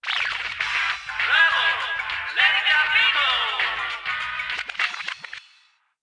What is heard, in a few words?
Radio Jingle